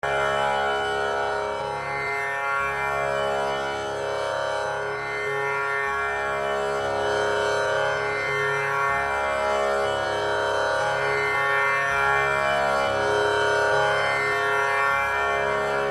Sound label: instrument tanpura